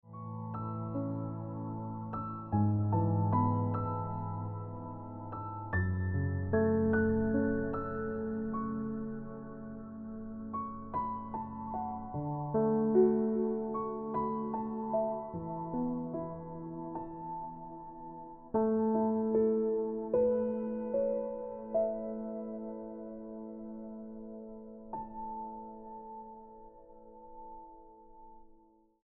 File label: Into The Woods Ringtone Piano Music